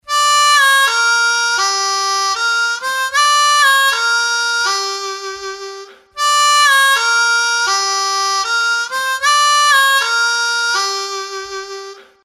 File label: bend, harmonica